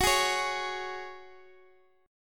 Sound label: F sharp Suspended 2nd, Guitar Open G